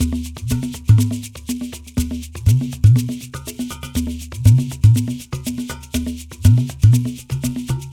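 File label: 120 bpm, udu calypso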